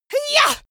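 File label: female combat attack long, screams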